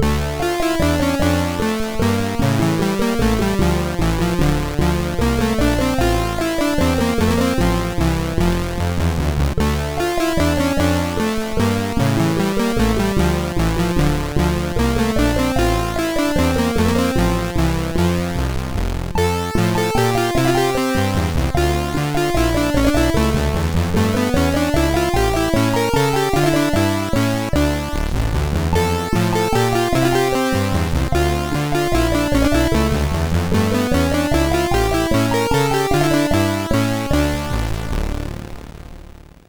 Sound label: Menuet D moll, MOS6581R4; Froyd